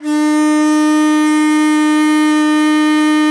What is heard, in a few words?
BON DI, Winds, Old World Instruments